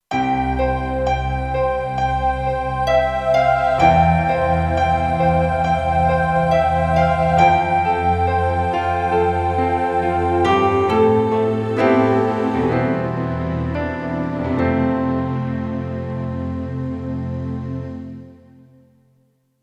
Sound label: Lullaby, Kurzweil K2500X